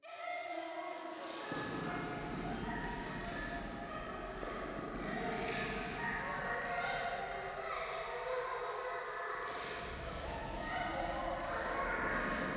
廃墟 喘ぎ 触手

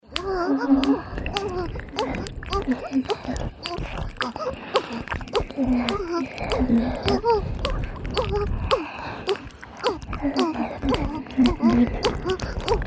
オホ喘ぎ＋れろれろ両耳舐め; おまけループ（オナサポ）; 3WAYループ

